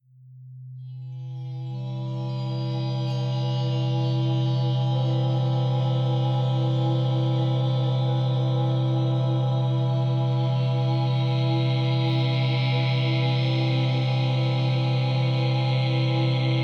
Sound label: Relaxing Spa Music, Deep Sleep Delta Waves